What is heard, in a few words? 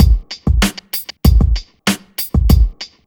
BEAT; Hip Hop R n B; AKAI; USB Soundscan vol.19